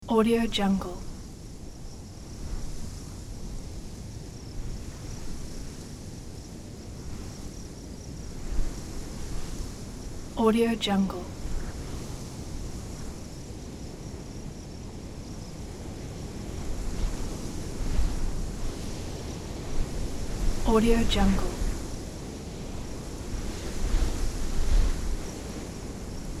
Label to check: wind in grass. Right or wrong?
Right